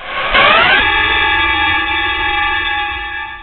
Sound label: THWING; Easy Personal Web Page